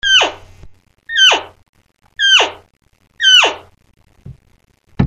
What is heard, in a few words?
cow chirp hand, elk